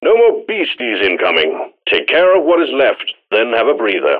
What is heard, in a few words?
Wave Warning End; Swarm; Deep Rock Galactic Boardgame; Mission Control